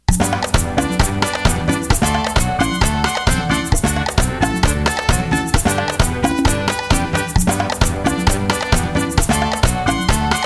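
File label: Merengue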